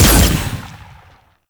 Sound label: Fire Shot